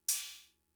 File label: Cymbal; Fricke MFB-522